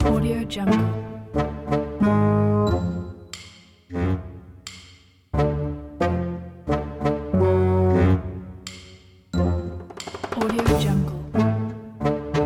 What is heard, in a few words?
sneaky spy